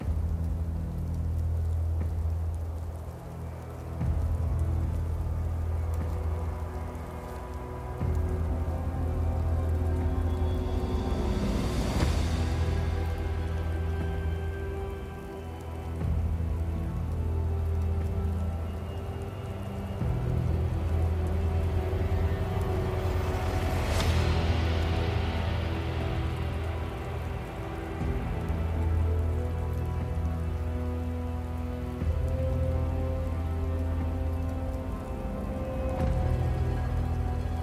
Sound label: Ambient; Athel